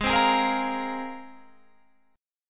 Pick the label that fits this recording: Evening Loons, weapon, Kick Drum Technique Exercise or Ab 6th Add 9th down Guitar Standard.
Ab 6th Add 9th down Guitar Standard